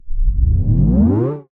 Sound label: Tape Start